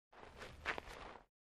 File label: Basball FS Mound, baseball; baseball fx & games